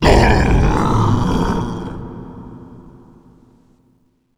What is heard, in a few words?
supermutant alert